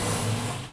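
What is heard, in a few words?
Small door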